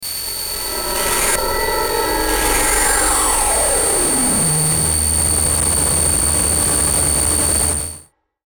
Descend Stutter High Frequency Distortion Glitch Dark; Descending Sound Effects; Blastwave